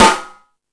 Sluggo Drums, E-MU Pro-Cussion

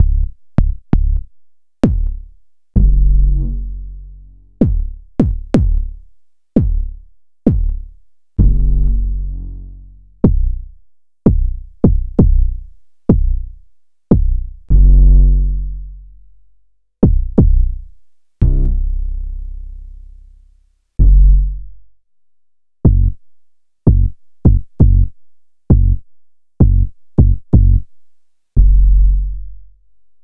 Bass High Quantity

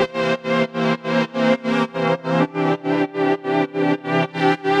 Pad 100 C, sidechained

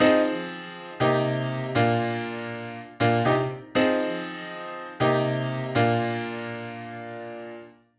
Chord Piano Cm, 120bpm; Zero-G; Extra Chord Progs

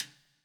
POWER RIM

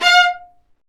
String Sections, Violas Marcato

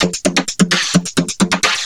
Vocobeat; Sonic Foundry (Sony Creative Software)

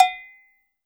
Cowbell, Roland BK-5 Percussion

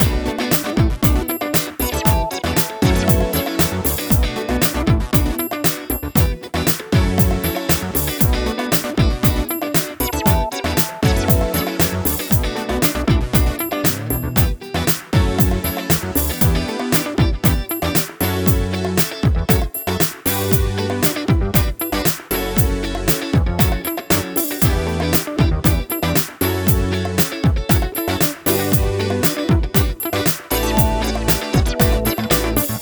Full Mix, Nu Disco, Busy Bm 117bpm; Zero-G; Construction Kits

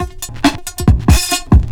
LOOP FX, Big Beat Drumloops

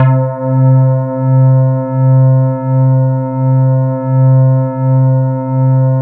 E-HARP, DX HARP; Wizoo - Powered DX

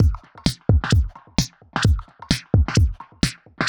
Beat filt 130bpm, uk garage

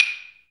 PER Percussion

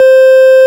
ORG, Farfisa Clarinet

